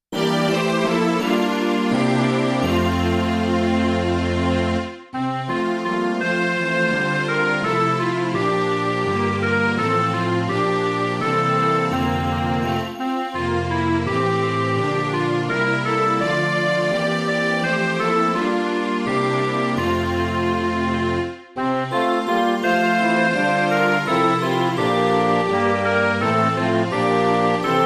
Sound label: behold a broken world midi